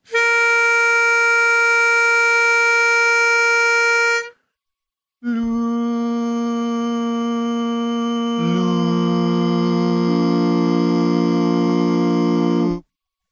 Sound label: Bass missing